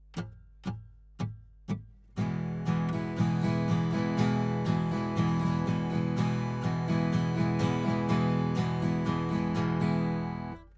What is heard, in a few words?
Strum 120bpm